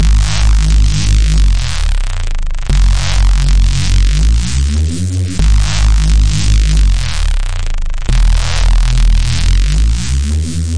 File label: bass; On A Roll